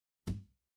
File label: impact thud